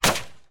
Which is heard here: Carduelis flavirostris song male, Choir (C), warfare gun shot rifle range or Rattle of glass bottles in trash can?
warfare gun shot rifle range